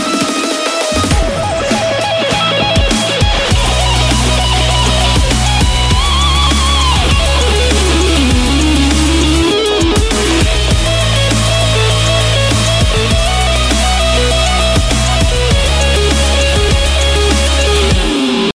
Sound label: Dubstep guitar solo